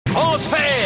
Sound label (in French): transfert; Goldorak CPC